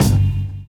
Kick

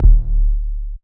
Not Nice 808's

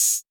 Hats, Closed Hats

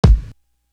Kick

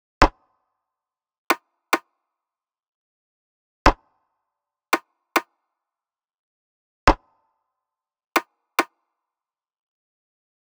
Percussion Loop 140 BPM; Cymatics

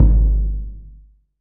Percussion

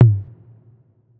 tom

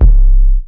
808s

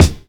K, Kicks; BUBBA

